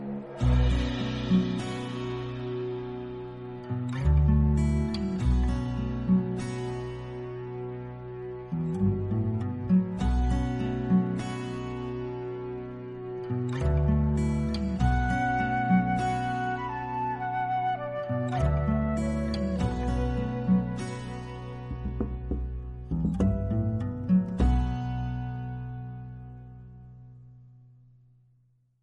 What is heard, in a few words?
Nature Melody